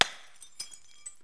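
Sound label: break small, destruct; Wired for Sound Pro CD; Aristosoft, Inc.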